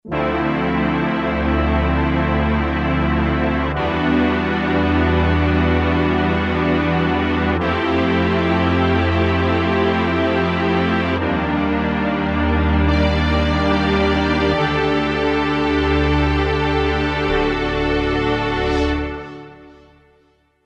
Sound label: PAD; Akai SG01v; PROFIT